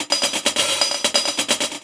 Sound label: Fill, Drums, Eclectic Electronica